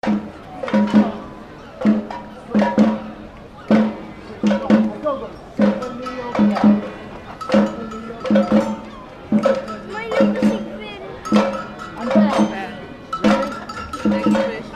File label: symphony of bells; ipswich